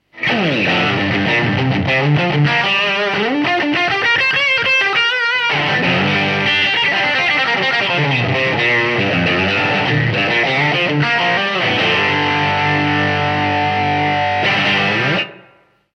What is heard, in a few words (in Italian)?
LEADER TELE BRIDGE eq